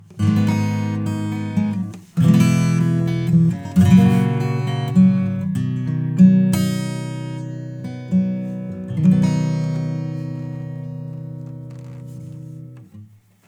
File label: Acoustic Guitar; Neutron 4; Speed 100